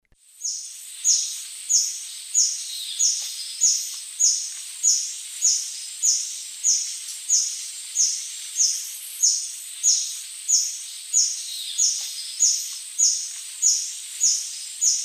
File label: Phaethornis hispidus, song; S. America; Eastern Lowlands